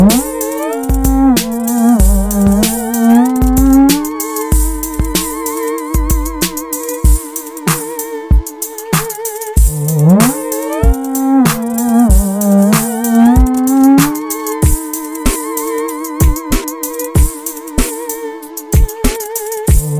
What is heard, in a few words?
ghostly, beat